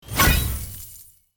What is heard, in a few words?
Money notification chime purchase sale